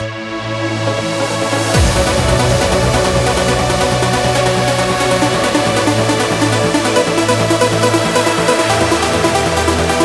Complete Mix 138BPM, B minor; Vengeance Samples; VTS1 Soothsayer Kit

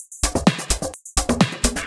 Percussive Loops 128BPM; Vengeance Samples

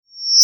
Sweeps Short

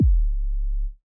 kik; Gangsta Sound Kit

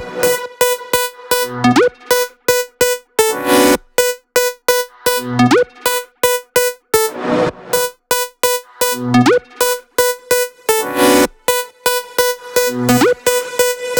Melody Kits 128BPM Root B; Vengeance Samples

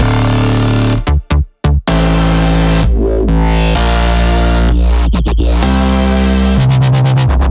128BPM Melody Root D; Uprising; Vengeance Samples; Uprising Kit

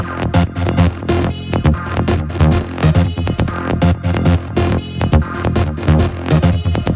138BPM Myst Melody

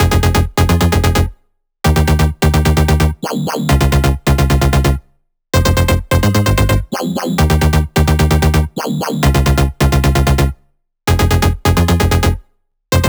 130BPM Melody; VFH1 130BPM Motor City Kit

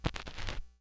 Needle Pop, Vinyl Record Samples

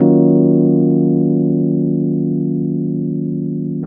rhodes A#, vocal house; PS-28 Vocal House Samples

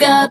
Synth Voc; Vengeance Samples; VEE Synth Shots

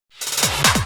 Fill 140 BPM

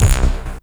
Synth Shot; Vengeance Samples

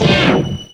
Vocal Oneshots; Vengeance Samples; Vocals

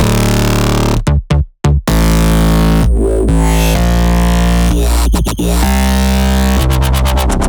128BPM Bass Root D; Uprising; Vengeance Samples; Uprising Kit

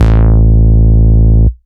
Bass Root A

